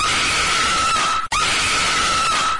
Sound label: scary scream; exorcist